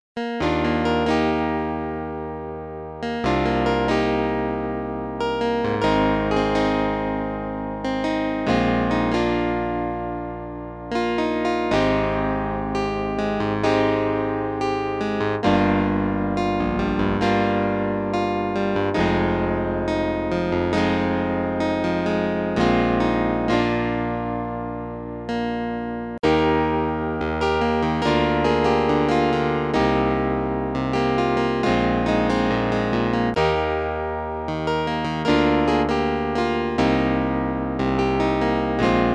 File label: Roland MKS-10 piano tremolo